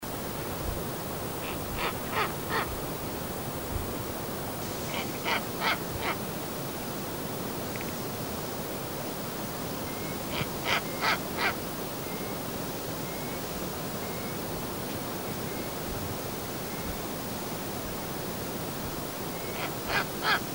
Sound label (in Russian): belaya sova golos